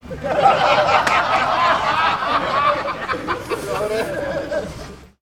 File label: Distant Male Group Laughter